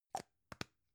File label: gum plastic box close soft